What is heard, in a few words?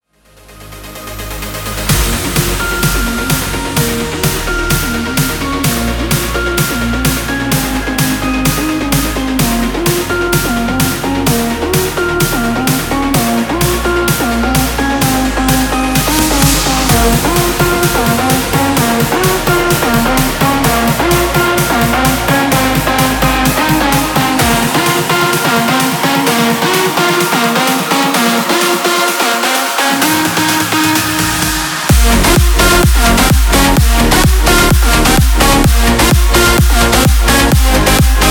Progressive House